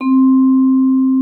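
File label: vibraphone C4; Xsample VOL-4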